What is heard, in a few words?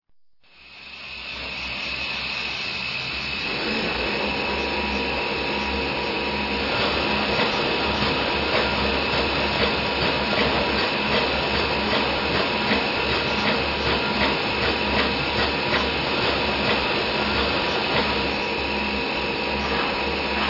BR38; CSD